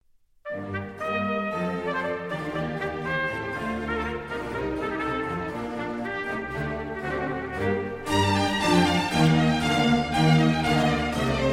Oboe Concerto in G Minor, Allegro; Oboe Concerto No. 3; HWV 287; The trumpet shall sound

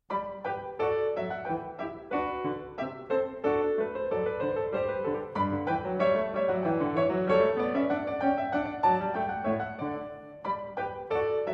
French Suite In G, Gavotte; French Suite No.5 In G, BWV 816; Vladimir Ashkenazy; Bach French Suites, BWV 812-817

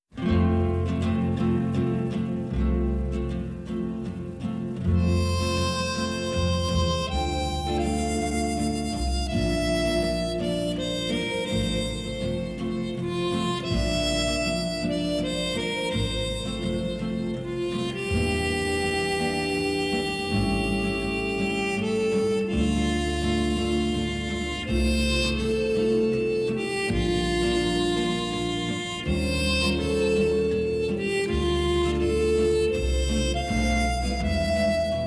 Harmonica And Guitar

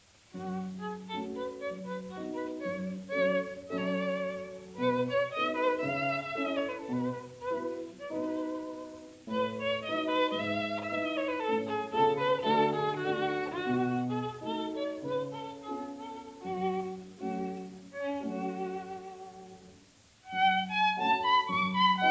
Romanian Folk Dances, Braul (Sash Dance); Bartók; Arr Székely; 6 Romanian Folk Dances, Sz. 56; The Menuhin Century; Yehudi Menuhin

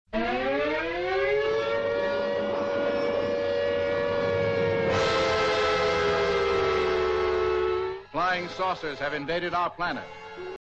Siren

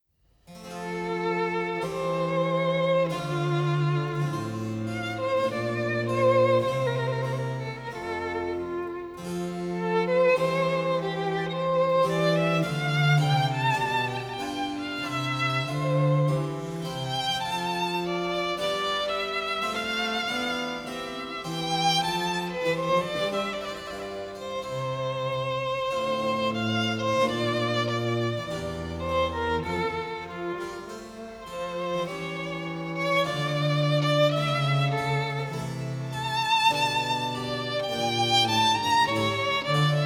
Violin Sonata in F Major, Preludio (Adagio); Corelli; Arr Donington; Op. 5 No. 10; The Menuhin Century; Yehudi Menuhin

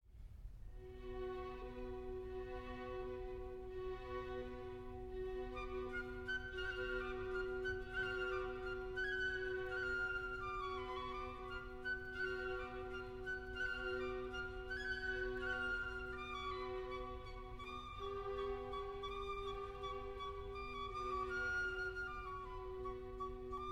Roumanian Folk Dances for Orchestra, In One Spot; BB 76 (Sz. 68); from Egres; Chicago Symphony Orchestra; Bartók

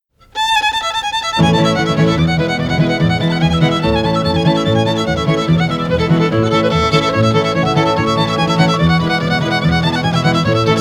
Fiddle Tune

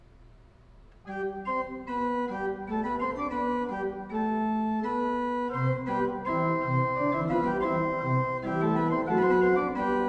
Fugue, Organ; Magnificat tertii toni; Johann Pachelbel; Complete Organ Works

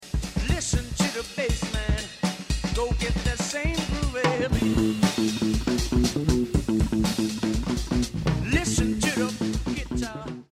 Bass

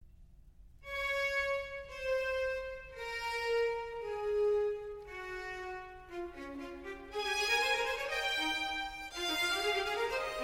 Concerto for Violin and Strings in D, Allegro; Vivaldi; Violin Concertos Nos. 1-6

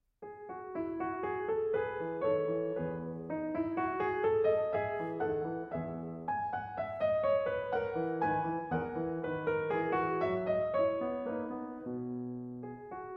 French Suite in E, Petit Menuet; French Suite No.6 in E, BWV 817; Vladimir Ashkenazy; Bach French Suites, BWV 812-817

